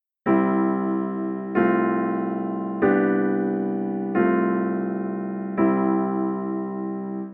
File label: Tonic pedal V I extended bass